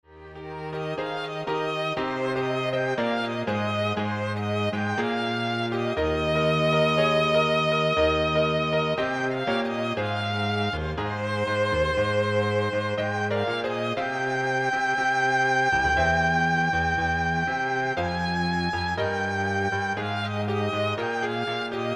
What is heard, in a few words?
Piano Trio